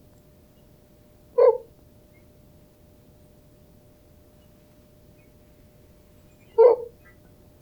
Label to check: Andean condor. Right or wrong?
Right